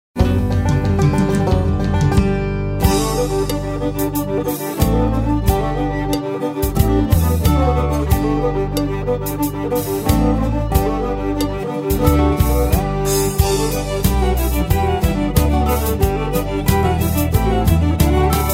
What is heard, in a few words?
Bed Creak (Bluegrass)